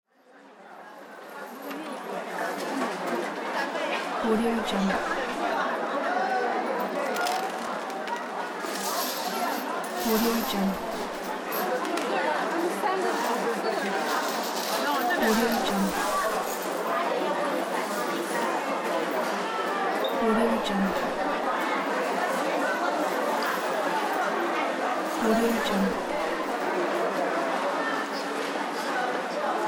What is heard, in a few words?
chinese market ambience